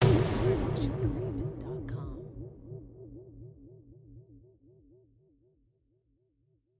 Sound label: designer sci-fi hit; SoundsCrate